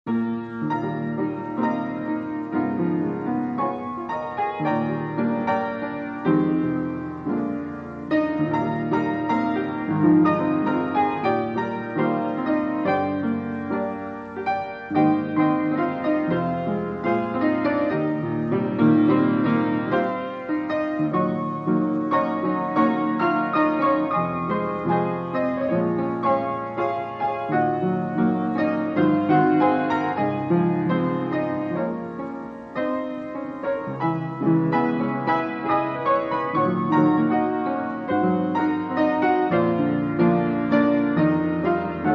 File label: theme piano